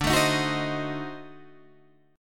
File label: D 7th Flat 9th D down Guitar Open D